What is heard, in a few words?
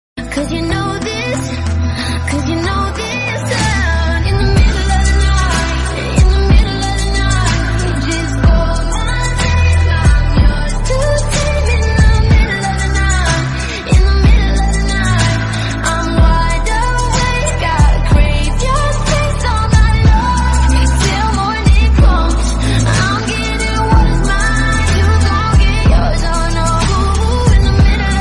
English Song Ringtone